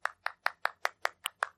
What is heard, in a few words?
delight emote; animal crossing